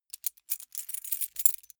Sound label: key ring mid size rattle; objekte; schluessel